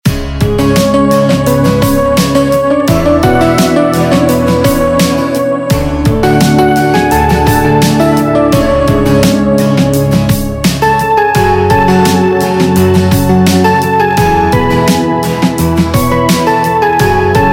Instrumental Ringtone; Bollywood Hits (2014)

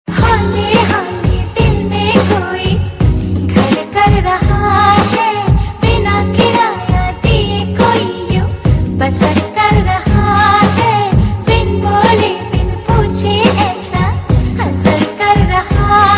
Female Version; Mrs. Kaushik Ki Paanch Bahuyien; Zee TV

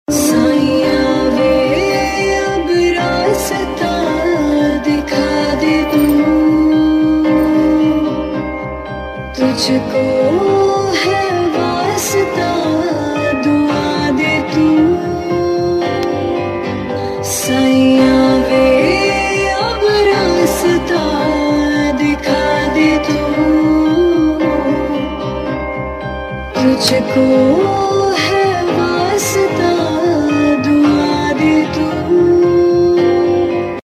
Sad Tune; Iss Pyaar Ko Kya Naam Doon - Ek Baar Phir

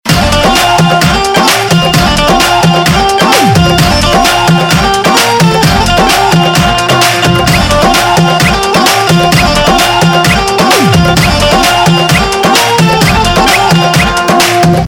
Theme Ringtone; Bollywood; Bareilly Ki Barfi

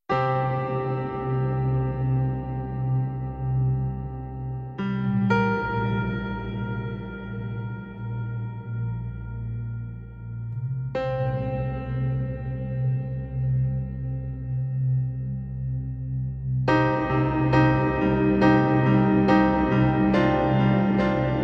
Instrumental; Phas Gaye Re Obama (2010)

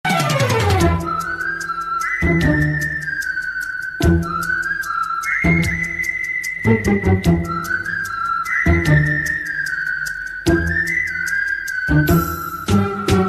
Music - Ringtone

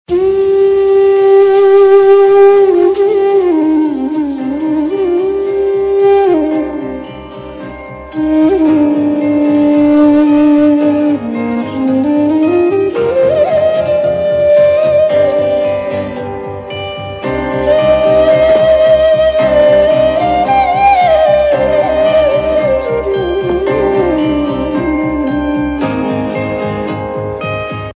Sad Tune; Iss Pyaar Ko Kya Naam Doon - Ek Baar Phir

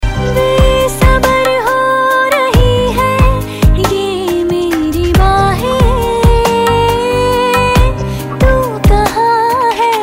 Female Version Ringtone; Bollywood; 1921

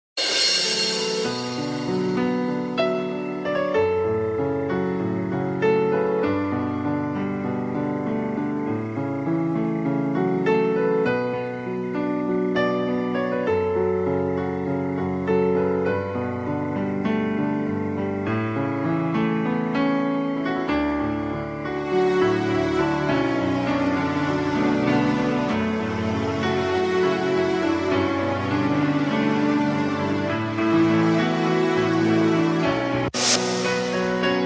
Flute Version; Tv Serials; Zee TV; Phir Subah Hogi

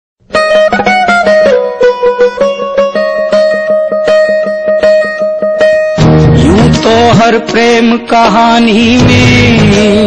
Music; Perfect Bride

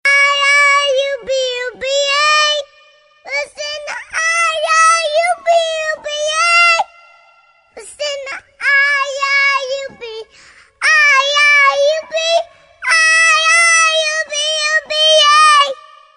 singing baby boy; Children Ringtones

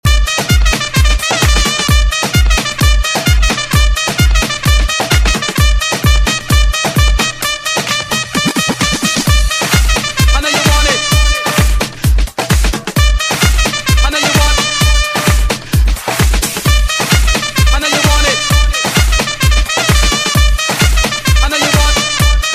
Ipl Horn Remix; IPL T20 Special

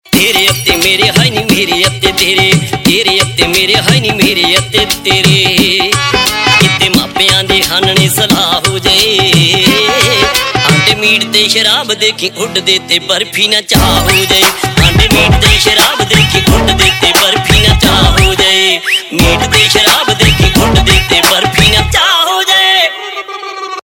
Ringtone, Punjabi

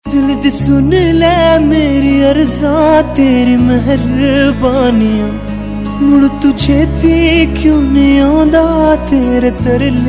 Sad Tone Ringtone, Punjabi

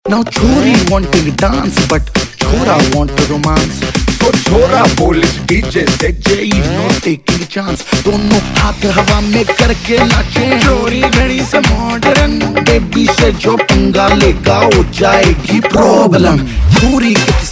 Rap Ringtone, Bollywood Punjabi Pop; Sultan

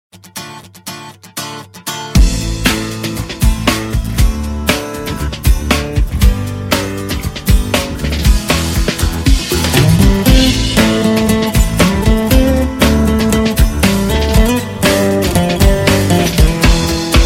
Guitar Tune

